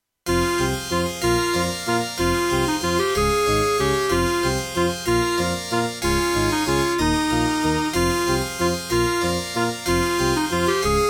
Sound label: Gravis UltraSound Extreme; Death Gate